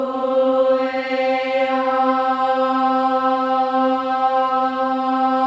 OH EH, Symphony of Voices; Spectrasonics

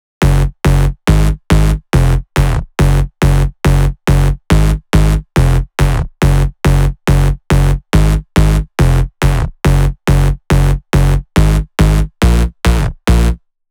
140BPM G#; Vengeance - Essential Clubsounds Vol.4

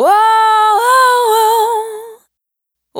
Urban Dry, Single Voice Melodic Loops

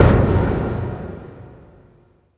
IMPLODE, PERC; NorthStar - The Wizard VOL-2; TSAR PERC

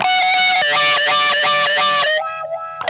OVER WAH, Funk Guitar; 90 s Sample CDs; Zero G

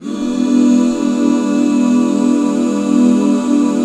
E 7, back chords; Sound & Vision - Gigapack II CD 1

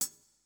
Kit, breakcore Drum Hits

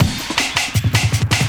LOOP L, Drum & Bass 160 BPM

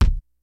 KICKS; Kid Nepro; Elite; KICKS SNARES

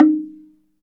SLIT DRM D#4; SLIT DRM1; Heart of Africa

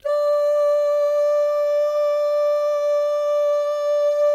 DOO MIX, Vocal Planet Jazz, GUY GAL; ILIO; Jazz & FX

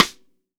TTRIM, KICK & SNARE; AKAI S6000 CD-ROM - Volume 1; DRUM RYTHM

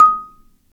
Marimba; Roland LCDP11; Africa VOL-1; Af.Marimbas

